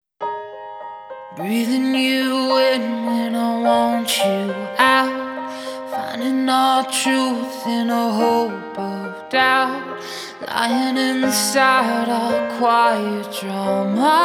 Acoustic Version

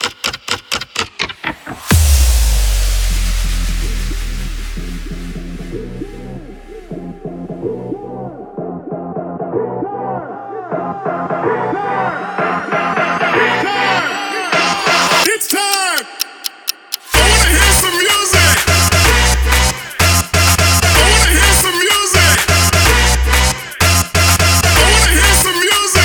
EDM

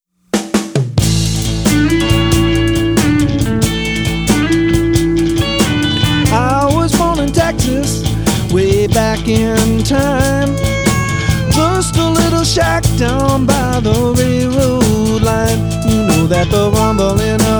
Blues

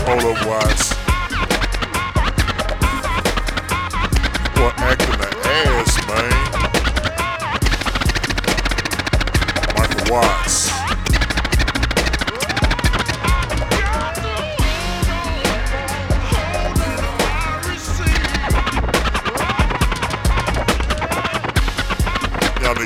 Scratch, Chopped & Screwed

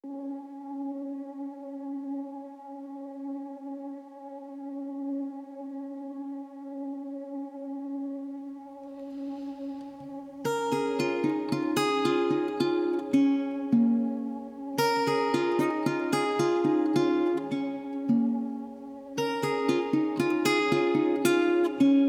Acoustic

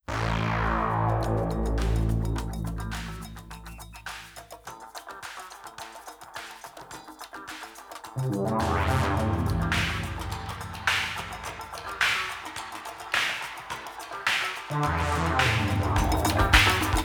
Kalimba Tree

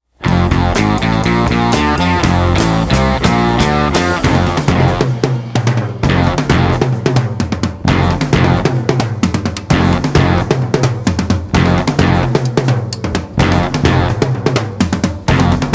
Instrumental Version

